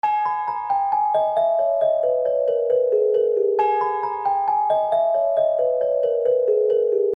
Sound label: Short Gothic